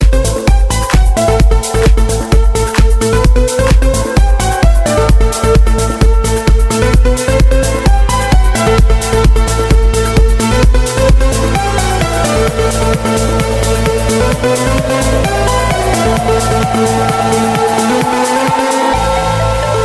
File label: trance, ringtones